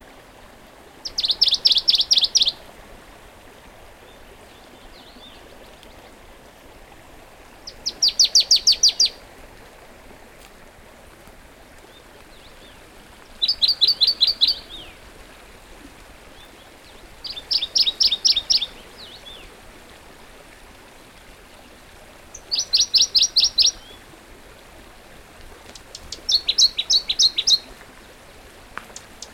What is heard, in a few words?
warbler dusky Phylloscopus fuscatus